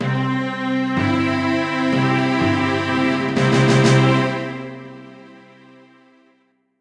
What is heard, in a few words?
fanfare